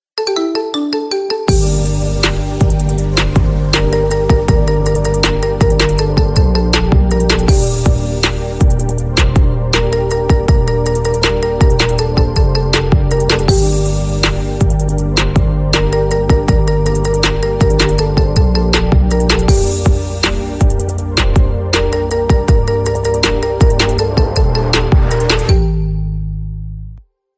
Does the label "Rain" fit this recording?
No